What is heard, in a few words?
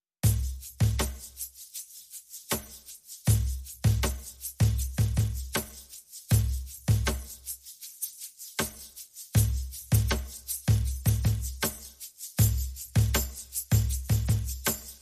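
Percussion Only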